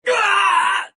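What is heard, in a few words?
spy pain critical death; Adachi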